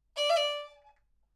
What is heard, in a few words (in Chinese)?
云南花灯 丝弦 演奏技法 打音 单打